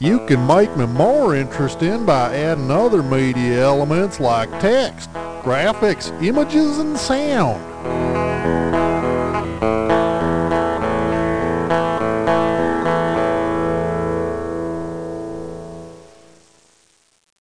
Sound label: GUITAR; Presentation Partner